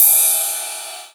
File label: Ride; Vengeance Samples; VEE Cymbals